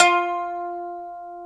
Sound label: M. KOTO F3 A, ETHNIC; AKAI S-Series CD-ROM Sound Library VOL-8